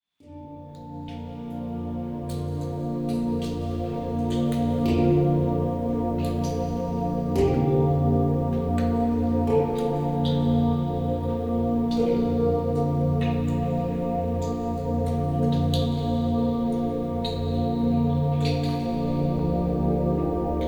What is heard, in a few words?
Ambient Music